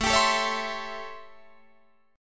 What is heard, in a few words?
A 7th Sharp 9th, Guitar, Open D